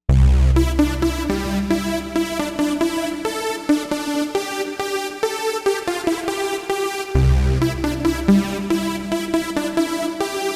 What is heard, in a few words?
Euphoric Lead; Novation UltraNova; Factory Patches